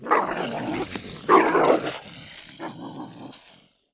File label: DOG FIT; PC Gamer IT CD 40; zones; abandoned